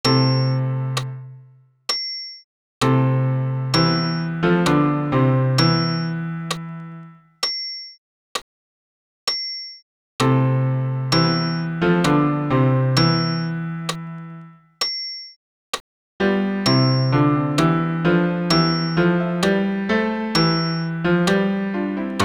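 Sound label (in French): Agnus basse piano accompagnement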